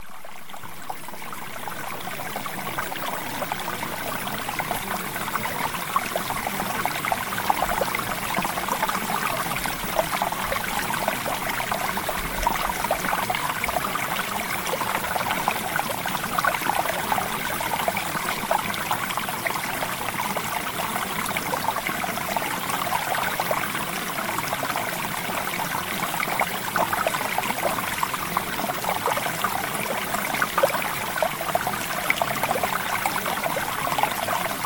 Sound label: Water; LOSE WEIGHT FAST; Premium Subliminals